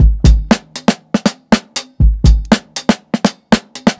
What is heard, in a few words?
120 FUNKY, DRUMS LOOPS; BASEMENT DRUMS VOL 2